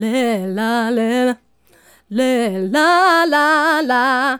Female Solo, Hip-Hop and RnB Vocals

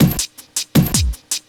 Beat, Futurist Drum & Bass; Drums & Percussion

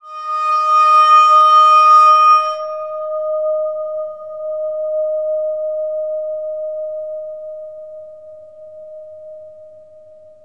WATERPHONE F; ILIO; Synclavier Percussion 2; World & Orchestral

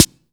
HOUSE DRUM SD

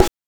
GHOST SD; NES DPCM; MIDINES

